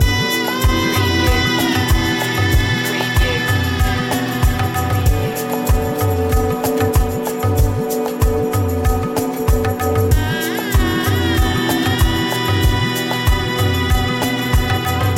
asian steppe loop

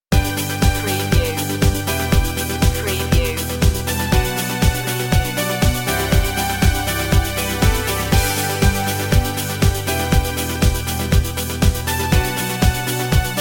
rise above

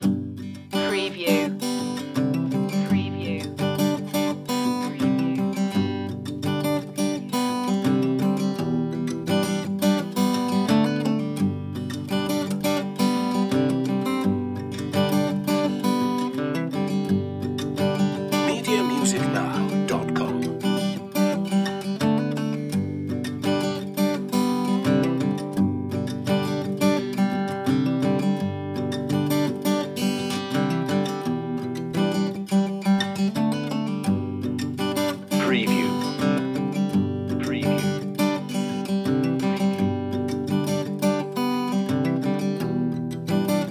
folk reduced mix

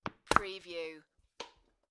Squeezy Honey Bottle; beanstalk audio

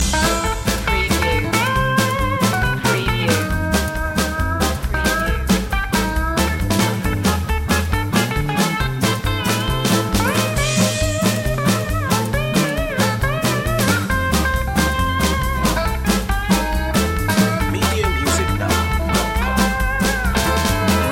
stomp loop

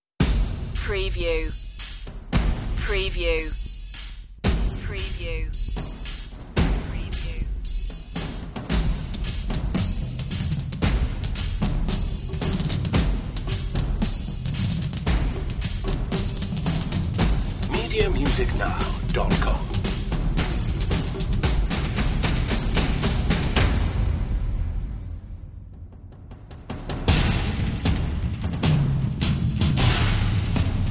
percussion only version

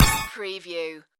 game menu select

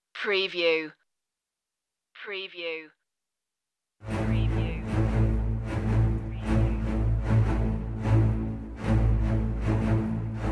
strings brass choir

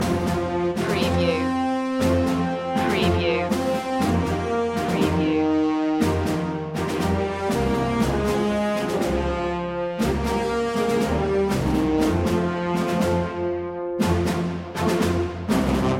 the long march home loop

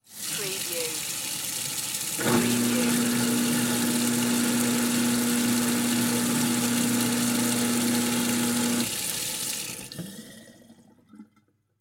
Garbage Disposal

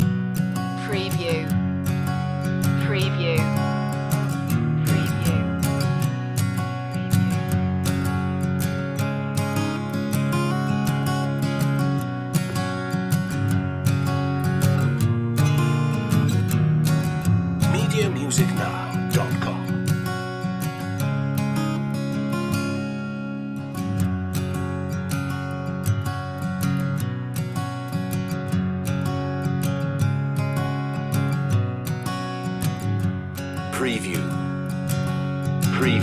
blue grass mellow loop

